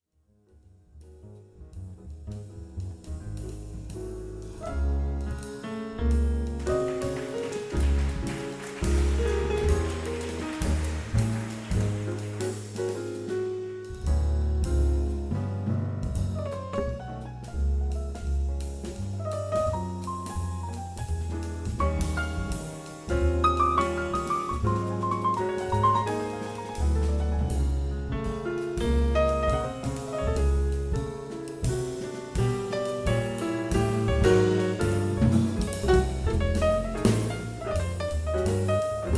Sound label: Polonaise In C Sharp Minor